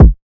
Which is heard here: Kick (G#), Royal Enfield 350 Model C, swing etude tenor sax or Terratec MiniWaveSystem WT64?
Kick (G#)